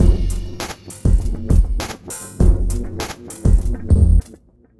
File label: Remixed Drums 100BPM; The Roots Drumloops